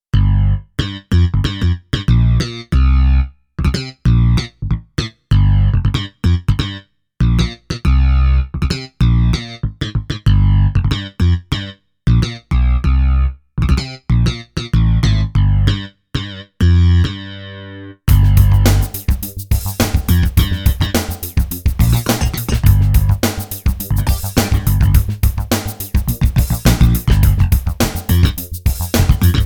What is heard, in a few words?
Slap Bass, Korg Radias-R